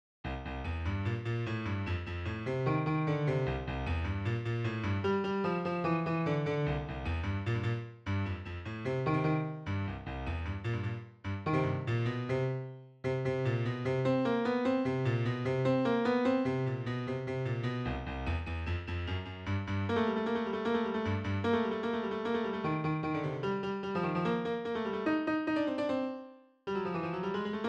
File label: Piano; Maniac Mansion